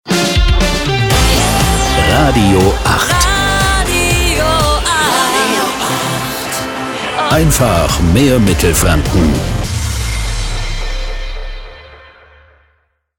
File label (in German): Transition Fast Pop aktuell FUNKY 2 soft aktuell; RADIO 8